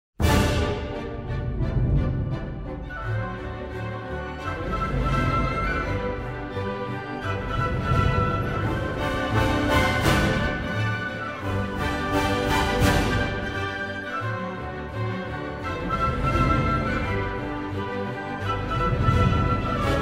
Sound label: thunder and lightning polka